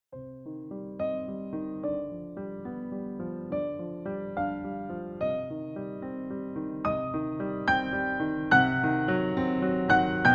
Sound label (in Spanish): Arpegios amplios